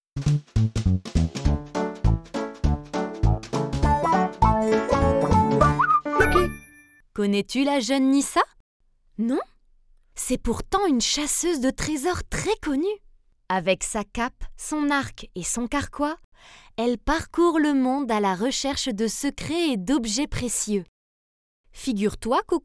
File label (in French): outro